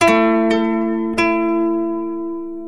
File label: KOTO LOOP